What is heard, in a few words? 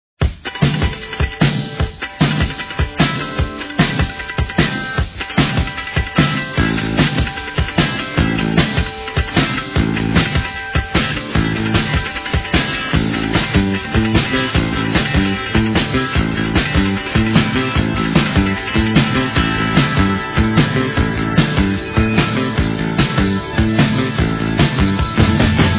beep